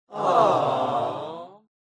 Crowd Aww

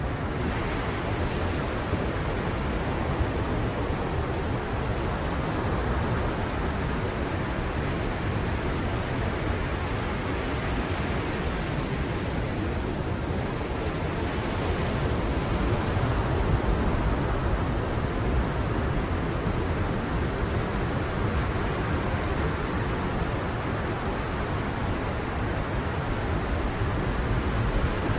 amb

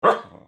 single bark of a dog

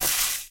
sizzle

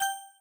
hover

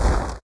Snow step

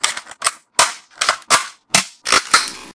reload gl

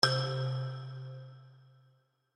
notification

